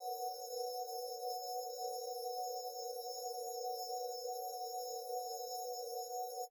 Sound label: pad; M8